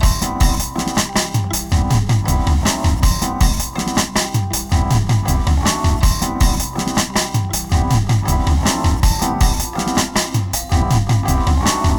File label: Drones & Textures